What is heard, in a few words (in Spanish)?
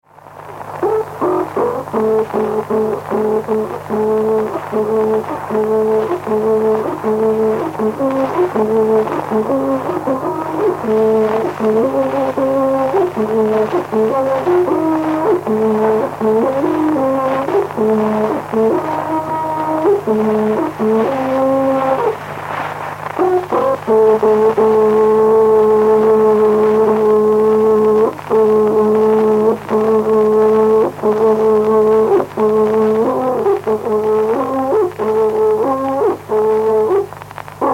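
Toque de trutruka, Mapuche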